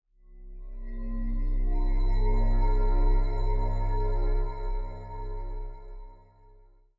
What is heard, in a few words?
Dark Nostromos Pad C3; multisampled; pads and strings